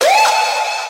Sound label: "woo" siren, voice; ooh ahh; ho hoo woo